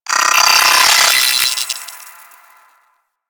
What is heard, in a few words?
Space Drone B Attack E; Warframe; Corpus Space Drone